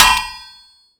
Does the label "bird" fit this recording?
No